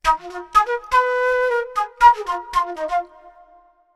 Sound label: Flute Concert Hall